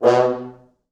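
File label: 4 French Horns, Horns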